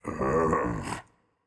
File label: ugh, voicepack; smite; jorm